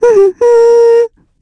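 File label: Vox Hum, Korean; heroes; Estelle; kingsraid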